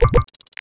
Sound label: new dossier